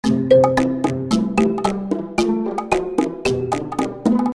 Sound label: Music Loop; Inai Inai Baa Oekaki Wanwan